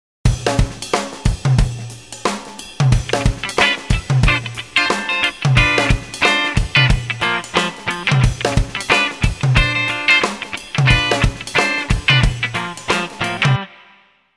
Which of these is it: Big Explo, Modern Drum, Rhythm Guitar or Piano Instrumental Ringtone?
Rhythm Guitar